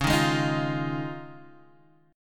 D sharp 7th Suspended 2nd Suspended 4th C sharp, Guitar